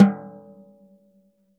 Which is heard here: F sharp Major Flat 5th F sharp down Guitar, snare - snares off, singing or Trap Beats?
snare - snares off